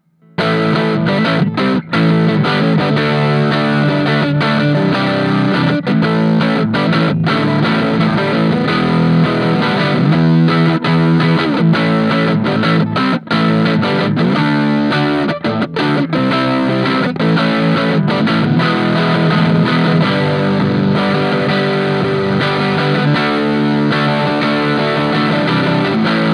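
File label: crunch neck, Crunchy Tones, Neck pickup; These pickups through a lot of signal at the front-end of the amp forcing my pre-amp tubes to compress. It’s most evident with the Neck pickup.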